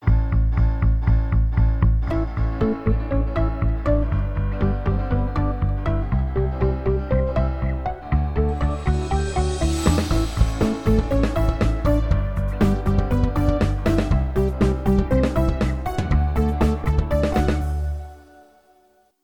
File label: Jingle